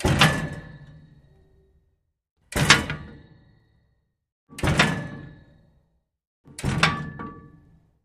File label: Elevator Old Door, old elevators